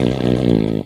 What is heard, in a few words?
fart medium